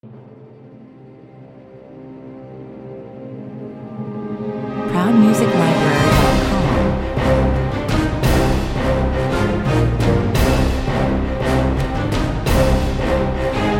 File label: blockbuster 85 bpm, Orchestral film music, blockbuster Hollywood style, with big movie drums and full orchestra; Perfect for action-packed trailer, opener or short corporate vi